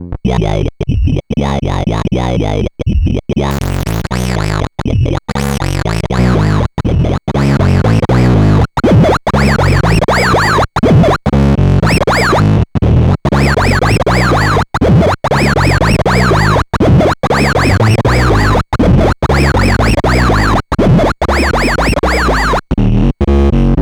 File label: monologue funky bitcrush